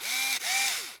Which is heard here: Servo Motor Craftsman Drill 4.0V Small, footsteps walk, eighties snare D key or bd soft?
Servo Motor Craftsman Drill 4.0V Small